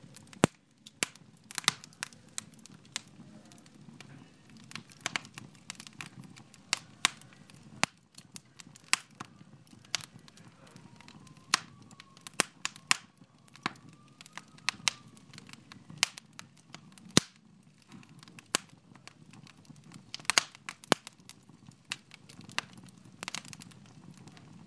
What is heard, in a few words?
fire crackle